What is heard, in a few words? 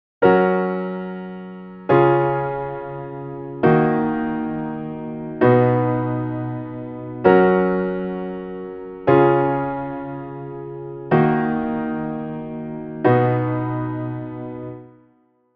4 chord; popformeln